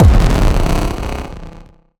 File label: cinematic impact; Bluezone; Destiny; Cinematic Ambiences And Sound Effects